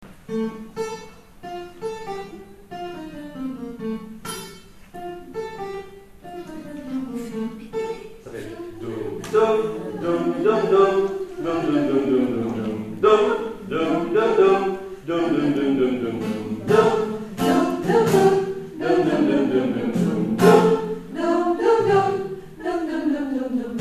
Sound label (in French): sopranes